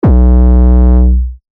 Eight Zero Eight

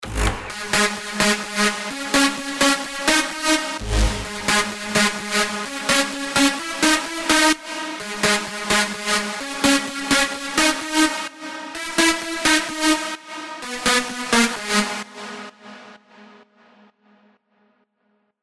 Big Room Leads G# WET (128 BPM)

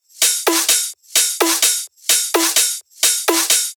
128 BPM

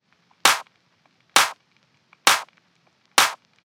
Clap Loop (132 BPM); TECHNO

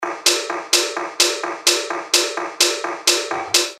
128 BPM; Fiscal

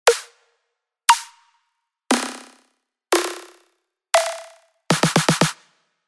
Analogue; Spire

